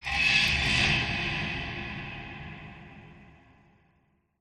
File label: Creep FX, horror and atmosphere fx; Silent Hill Downpour